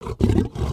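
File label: Sabertooth speak; creatures; pets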